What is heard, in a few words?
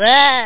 wuurgh; deathfx